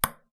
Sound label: Ping Pong Ball Single